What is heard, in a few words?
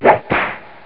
fewer bombs; Dynamite